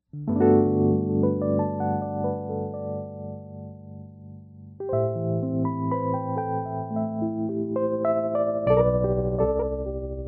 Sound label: lush vibrato, electric piano